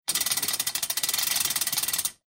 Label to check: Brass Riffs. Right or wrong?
Wrong